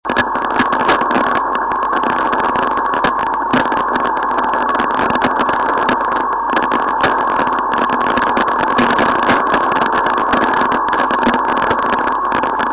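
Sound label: unidentified 14.5 kHz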